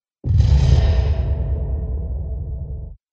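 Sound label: cinema transition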